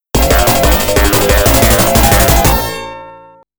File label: Game Set, Game Over; toaplan arcade sound digital collection vol.03